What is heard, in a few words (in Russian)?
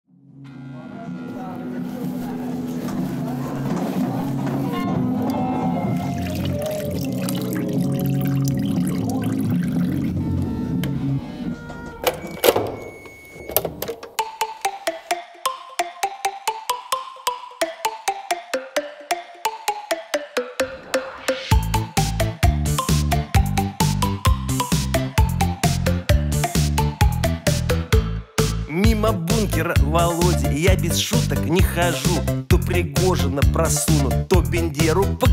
политические частушки; лукашенко; захарова; медвед